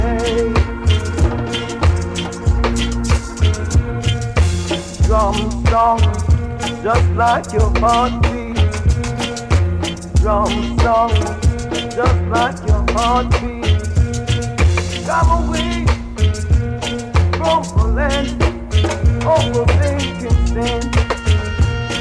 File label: drum song, roots